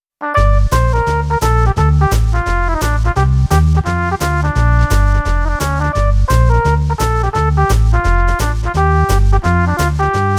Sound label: Trombone Instrument